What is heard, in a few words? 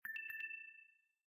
Low Battery In Call; LG; SU760 Optimus 3D